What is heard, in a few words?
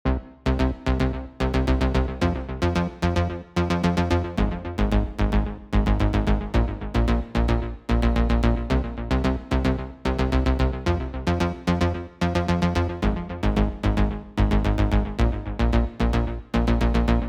Move Slow 80 Bass; retro 80s synth wave; retro 80s synth wave presets abx3 vol1